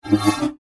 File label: Scanner Beam By, Scifi; Science, Fiction; Tech Sound Effects; SoundEffects-BlastWave